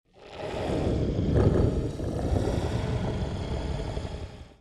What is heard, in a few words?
growl; the wolfs night